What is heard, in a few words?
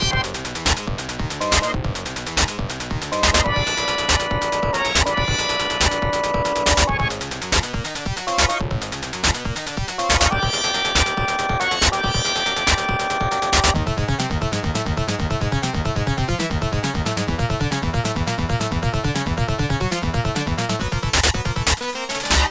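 background music; Last Duel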